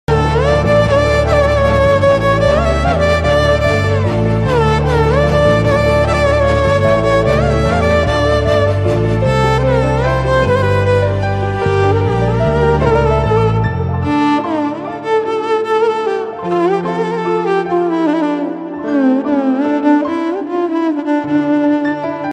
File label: bgm flute